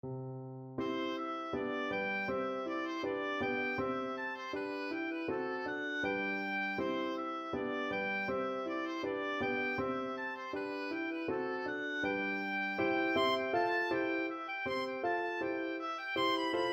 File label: handel harmonius blacksmith, oboe